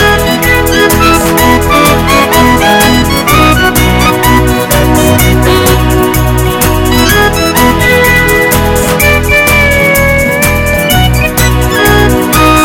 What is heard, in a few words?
Easy and Loving Music